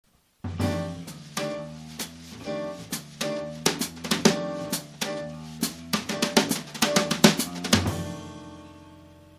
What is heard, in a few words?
brushes, drum